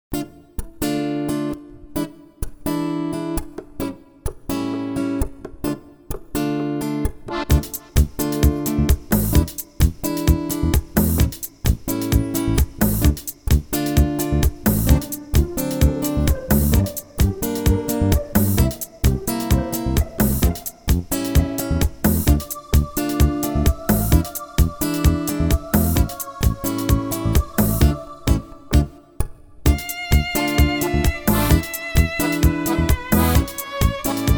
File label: Tango d-moll; OST грязная работа